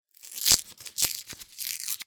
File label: food candy throat lozenge unpeel wrapper